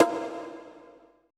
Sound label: CONGA L; BPC DRUMS